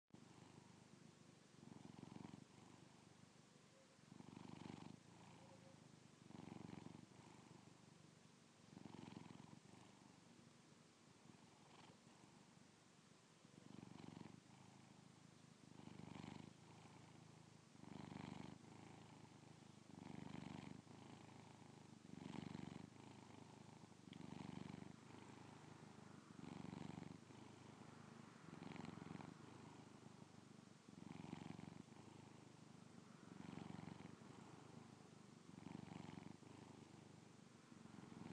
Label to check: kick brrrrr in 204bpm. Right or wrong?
Wrong